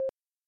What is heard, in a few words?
Instrument